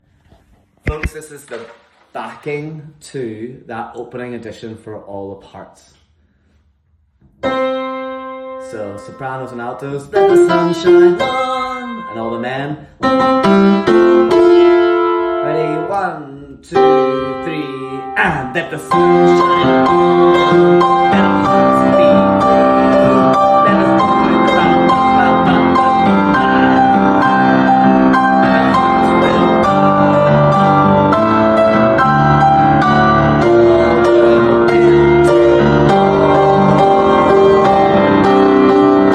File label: Ensemble Backing Opening; Disney's Frozen; auditions